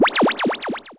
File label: WARBLE ZAP